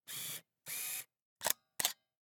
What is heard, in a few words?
Camera, Pentax KS2, DSLR, electronic, mechanical, focus, quick, snapshot; Eiravaein Works; Vaeyan IV